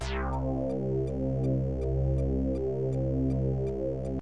Jupiter, synth Jupiter6; cubase; CUBASIS

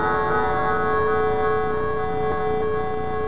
BELL TOLL

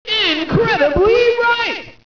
hurray

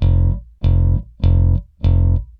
BASS, ROCK POP; MAGIX

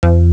elevator off; ParadroidII

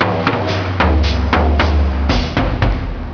DRUMS IN; MUSICAL

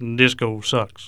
DISCO

